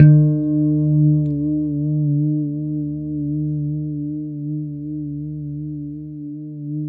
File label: E3 pick harmonics, guitars; Spectrasonics; Hans Zimmer Guitars VOL-1; JC-HARMONICS